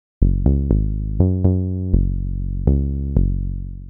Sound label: 122 BPM Bass Synth Amaj Fmin; Tonic F; Pro Bass Synth Studio Kit; MMB